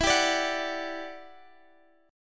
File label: E Minor 9th E down Guitar Standard; Em9; strummed; JGuitar